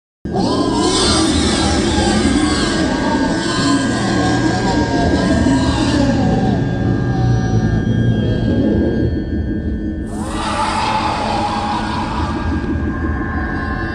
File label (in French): son entite parking